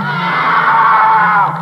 scream

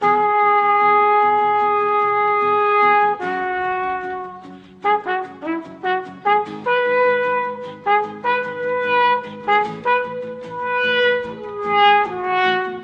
horns, Loops

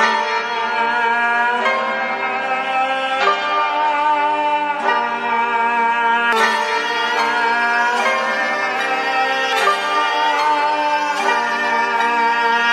keys voice, Loops, Sad

